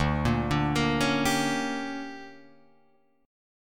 D Major 7th Suspended 4th Sharp 5th, Guitar Drop D